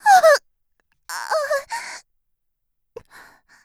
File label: die; Eternal Return; Tia; Voice Line; KOR